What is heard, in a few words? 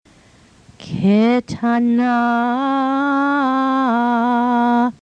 Haftarah; K'tanah; bnaimitzvahprep